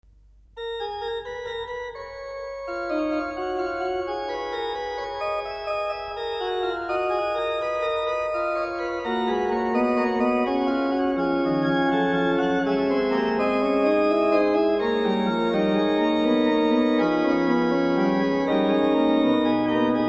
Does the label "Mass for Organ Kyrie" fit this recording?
Yes